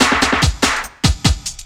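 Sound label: loop snare drum, 145 BPM, drum & bass; Ueberschall; Drum & Bass Flash Trackz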